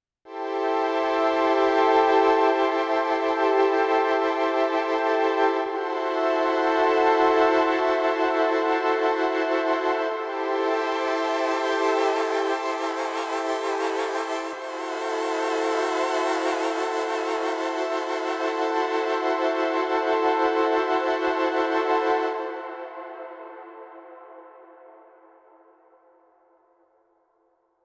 vibing pads

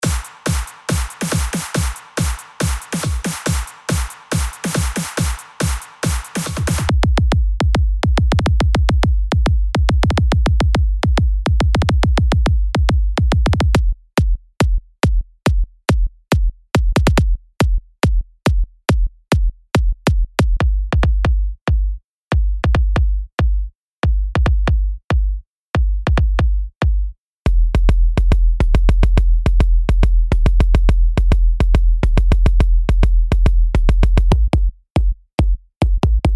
dance kick

